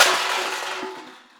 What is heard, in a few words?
metal bin hit